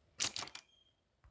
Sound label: Water splash; Action